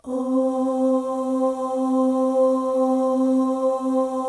OH, M+F; Spectrasonics; Symphony of Voices; M+F OHS

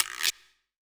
R-8 guiro; EdgeSounds; Drum Mashines; R-8 DRUMS

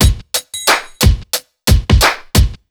BEAT, Underground Hip Hop

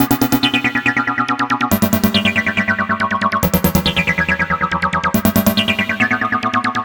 NRG Arp, trance

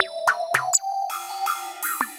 Beats; Synchro Funk; Dedroks; Sonic Foundry (Sony Creative Software)

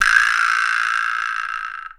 VIBRASLAP, LATIN percussion; Voice Crystal - Steve Reid Definitive Percussion; LATIN SET

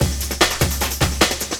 LOOP, Drum & Bass, 150 BPM

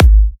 Kicks G#; markus hakala

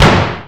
TOM G; Greytsounds VOL-1; SDX TOMS

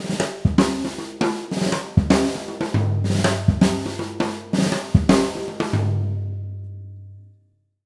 160 BRKBT; Univers Sons; Jazzistic

